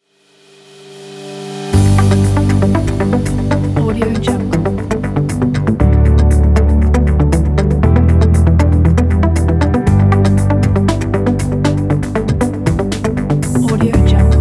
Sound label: product commercial